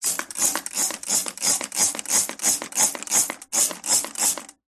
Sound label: household spray bottle spray cleaning solution into metal sink; zapsplat